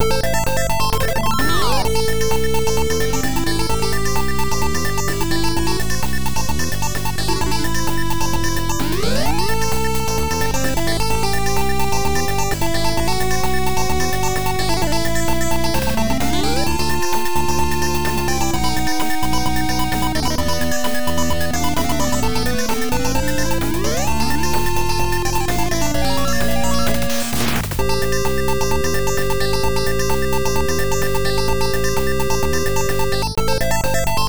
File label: Appear; Dragon Knight II; PC-9801